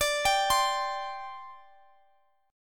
D 7th Suspended 4th D Guitar Open D

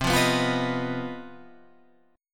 C Minor Major 7th Double Flat 5th chord

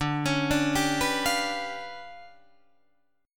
D Minor Major 13th, down, Guitar, DADGAD

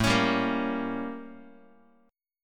A 7th Flat 9th Guitar; A7b9 strummed; JGuitar

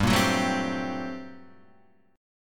G Minor Major 11th, Guitar, Half Step Down

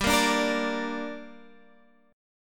G Minor G, Guitar, Standard; strummed; 15,13,12,15,15,x; JGuitar

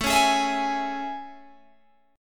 A Major 7th A, Guitar, Open G

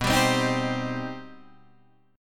C Minor B; Cm/B strummed; JGuitar

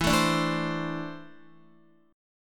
E 7th Suspended 4th Sharp 5th, Guitar; strummed

